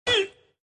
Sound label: Pain hit